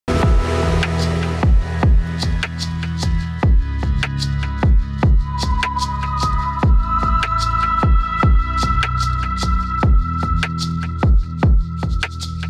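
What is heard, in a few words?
original soundtrack background music ringtone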